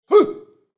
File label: Boxing fight vocalization male grunt